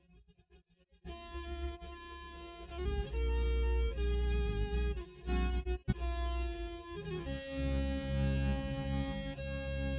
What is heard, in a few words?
nodrums